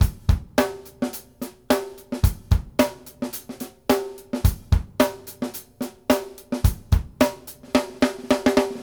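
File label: basic, latin, drums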